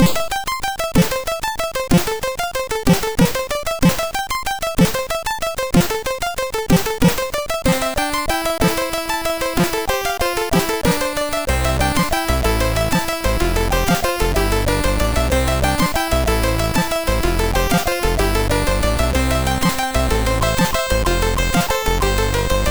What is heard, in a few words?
Slow Rock, MOS6581R3